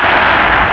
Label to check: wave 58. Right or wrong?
Right